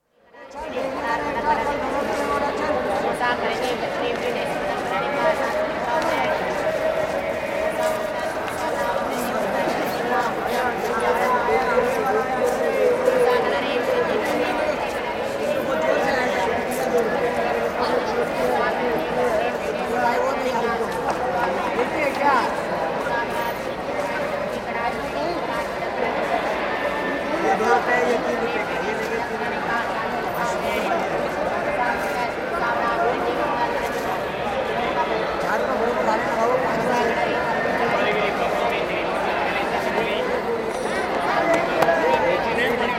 Mumbai: Large, noisy crowd at Gateway of India, with hawkers in covered enclosure, slight echo; Crowd Sound Effects; BBC